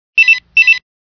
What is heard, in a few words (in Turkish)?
Panasonic EB TX220 Zil Sesi